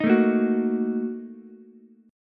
A sharp Major 7th Suspended 4th Sharp 5th, down, Ukulele; strummed; JGuitar